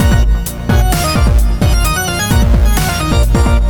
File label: Electro Charge